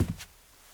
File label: carpet step, Footsteps; civ13; civ14